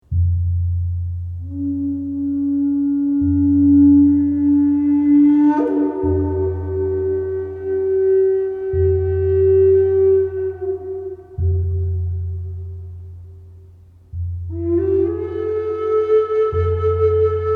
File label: indian flute